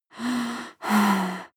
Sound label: breath female moaning breath